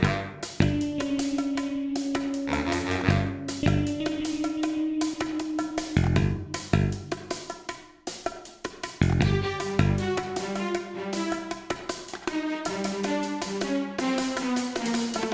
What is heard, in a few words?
Bee Hive Platforming MIXED; Ben 10; switch gamerip